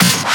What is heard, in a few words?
Snare; Vengeance Samples